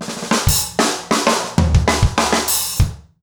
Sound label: basic funk 100 fill